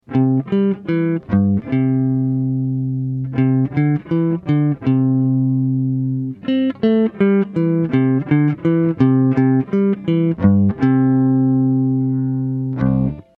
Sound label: simple melody